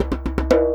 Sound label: Djembe Fill; musicradar